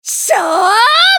攻撃長; カリュケ

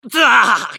男･ダウン; マスケッティア

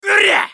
攻撃; ソホ

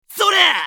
攻撃短; コインブラトゥルーパー

